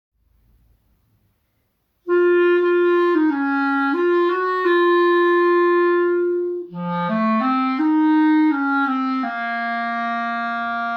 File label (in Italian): clarinetto; Galeone